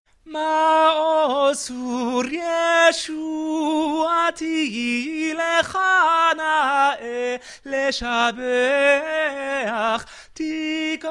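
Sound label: alt, choir music; BCC choir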